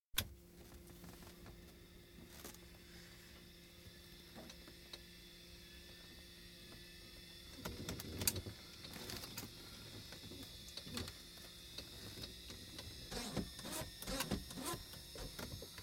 Cessna C150, interior, engine false start, run, taxi (Light aircraft, single piston engine); Airplane Sound Effects; BBC